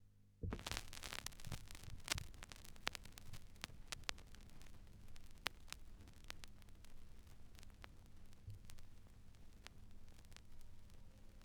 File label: Turntablism; Sonokinetic - The Roots Drumloops